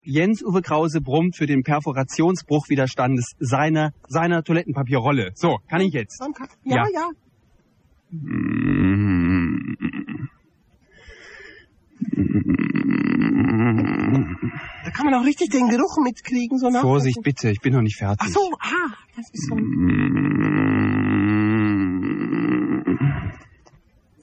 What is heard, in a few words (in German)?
erotisches brummen